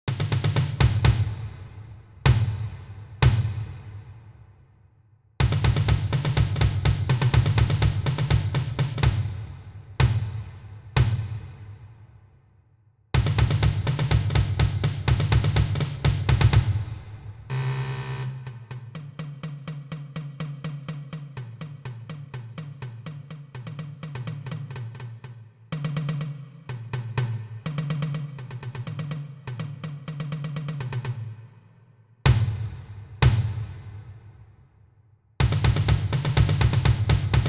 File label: Battery